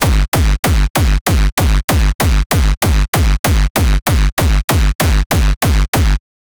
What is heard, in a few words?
hardstyle pitched kicks